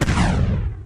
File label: rocket Strike; galaxyinvaders